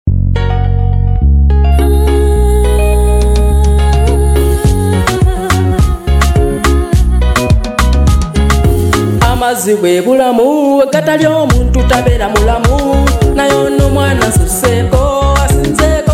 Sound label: Latest Ugandan Music